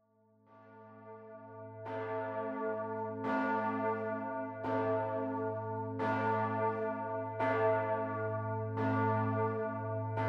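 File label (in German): bürgerglocke, geläut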